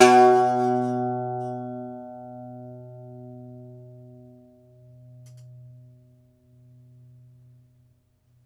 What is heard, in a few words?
IRISH BAZ, Plucked Strings, Irish Bazouki; E-MU Producer Series Vol. 7 – Old World Instruments